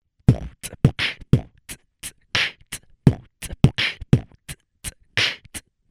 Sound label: beatbox loop added compressor recording